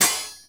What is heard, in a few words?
EASY SHAKE; PERCUSSIVE